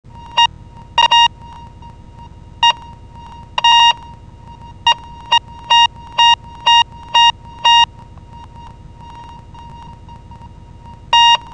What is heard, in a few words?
heartbeat